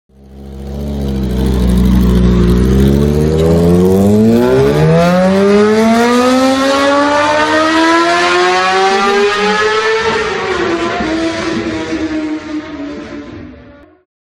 motorcycle sound

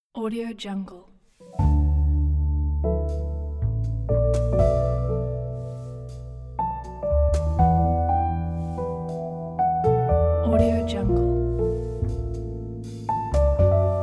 sentimental romantic; musicstudiort

